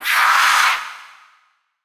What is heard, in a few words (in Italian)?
Lamento Banshee